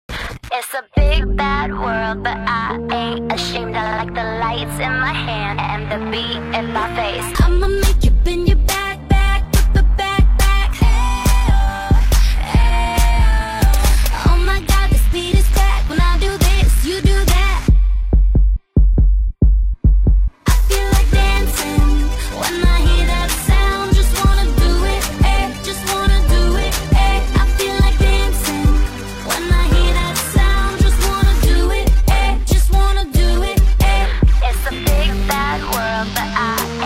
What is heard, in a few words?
Beat